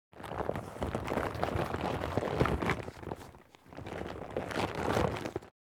Rubber mat handling and stretching

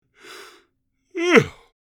Aged man pronounced yawn

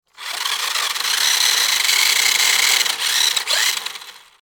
Remote controlled sport car road movement; rc toys

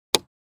Motorbike indicators turn indicator off; vehicle

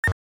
Futuristic alarm or warning single alert

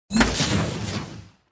Sci-fi space door robotic latch electronic

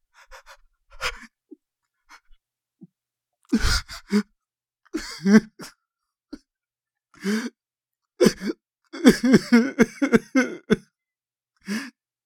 Crying man whining or complaining cry

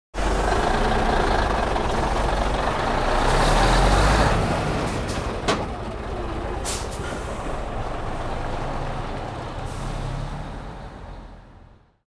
Truck close departure pass by and away

